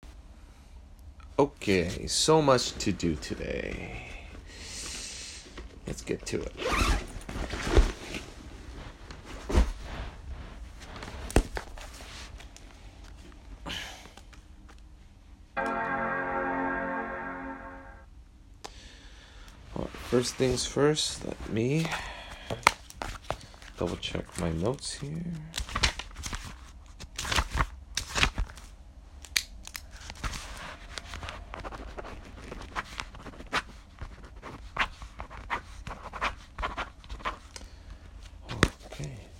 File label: E Pender St